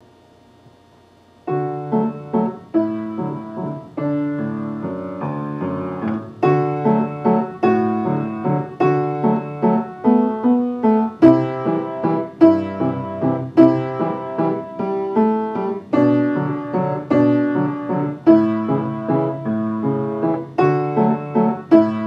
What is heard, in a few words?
string; d string